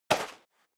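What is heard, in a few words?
step dress boots sand single left, footsteps; schritte; menschen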